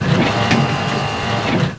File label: motor; MacAddict